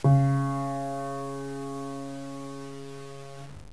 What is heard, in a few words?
piano cis